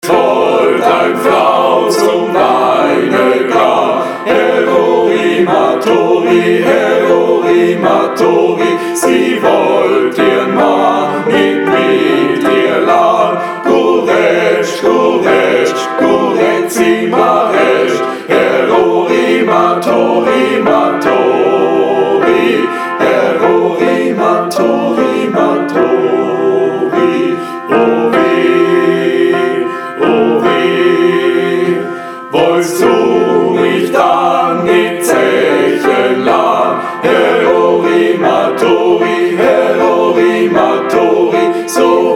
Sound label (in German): Es wollt ein frau zum weine gahn ALLE STIMMEN; Gesang